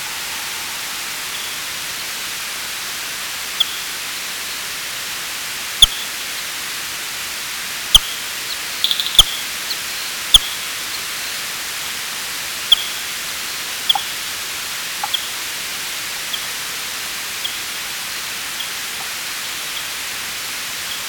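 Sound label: Barbastella barbastellus, bat; Diehl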